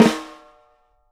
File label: CAIXA TR, BRAZIL